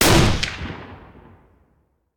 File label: Rifle; Sound Ideas; Guns